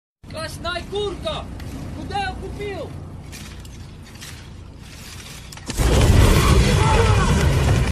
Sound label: guy attacks bus with a flamethrower